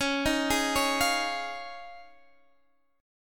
F Minor 7th Sharp 5th Db

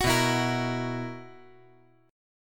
B Major 7th Suspended 4th, chord

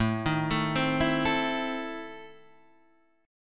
E Major 7th Suspended 4th Sharp 5th A

